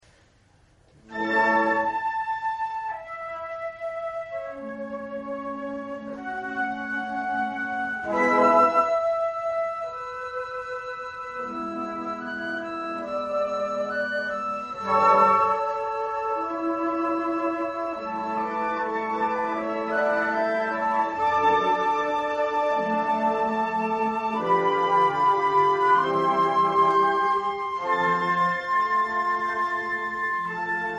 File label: new year consert, flute